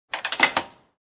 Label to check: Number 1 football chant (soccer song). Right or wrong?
Wrong